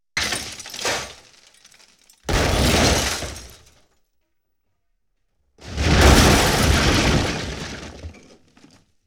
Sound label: Explosion, 5.1 Surround